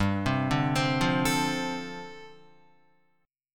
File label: G 9th Suspended 4th, Guitar; arpeggiated; G9sus4